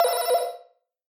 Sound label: Warning Alarm